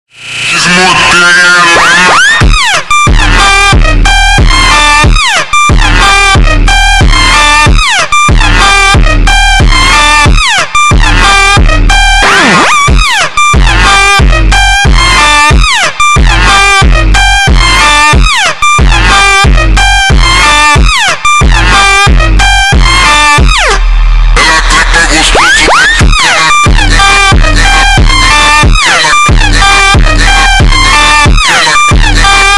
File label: montagem slowed reverb